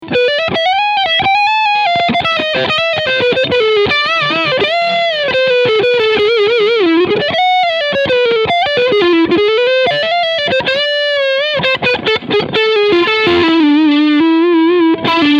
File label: Traveler Guitar Travelcaster Deluxe Leadsound Front Pickup